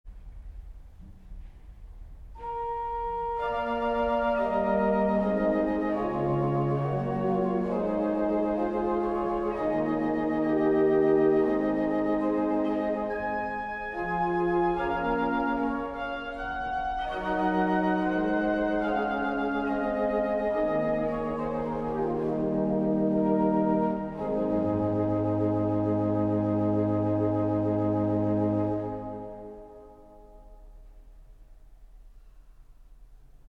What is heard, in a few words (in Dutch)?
improvisatie, kerk; oosthuizen